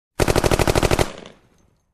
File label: Machine gun sound